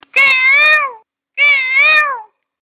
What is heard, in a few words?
Peacock; Peacock Tone